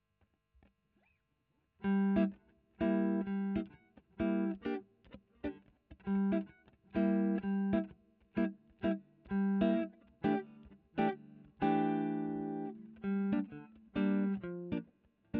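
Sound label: Electric guitar Royer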